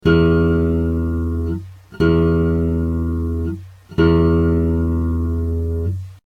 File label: bass tuner E